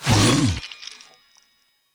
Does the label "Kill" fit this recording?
Yes